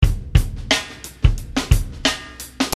Dream Hop

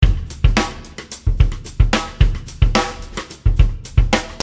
Shuffle

